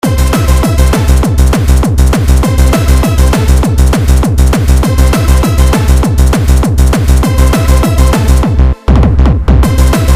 HardDance

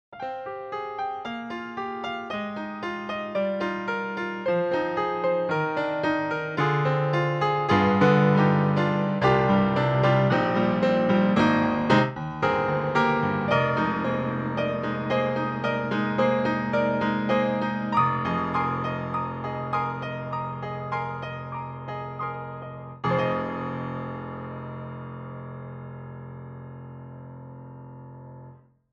GP 4th rt sim

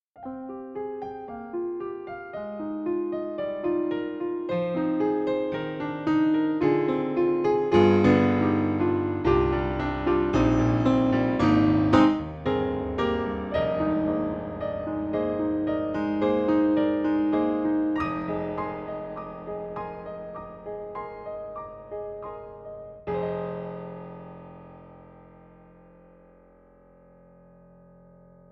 ivory italian, piano; synthogy

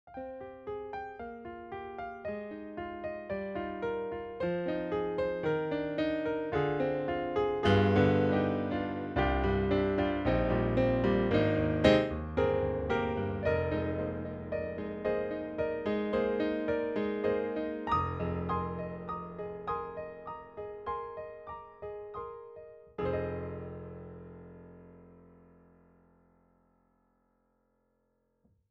piano; stein-hs2

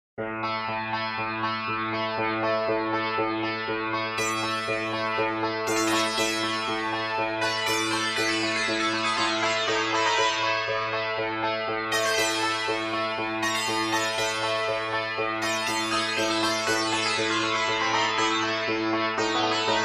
arab

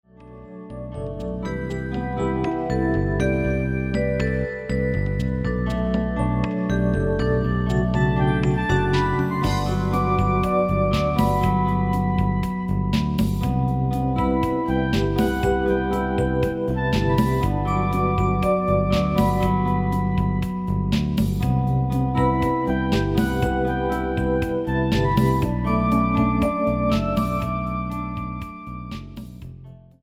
pop

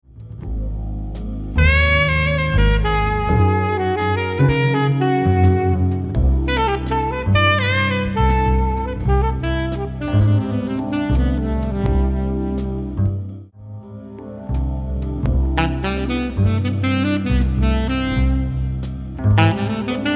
Song Alto

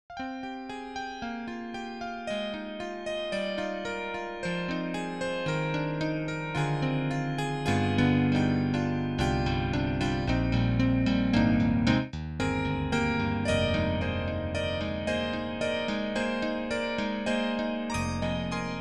piano

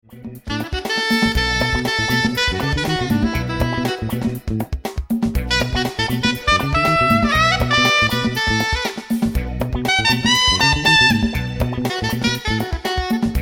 Funk Sop; SopCS

